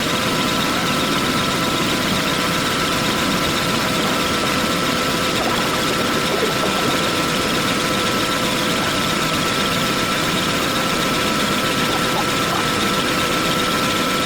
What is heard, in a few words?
speed, gravadora aliencell x1 40w